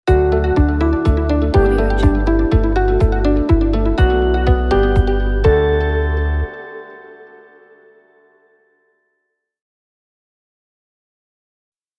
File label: happy uplifting inspiring logo